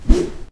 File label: katana swing